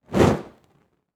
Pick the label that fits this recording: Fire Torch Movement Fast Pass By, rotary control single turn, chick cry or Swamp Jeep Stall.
Fire Torch Movement Fast Pass By